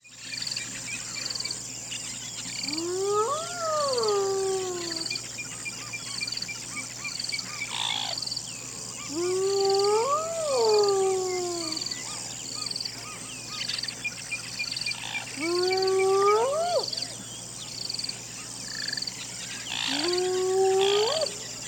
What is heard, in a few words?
hyena in jungle; Golden Crayon Sounds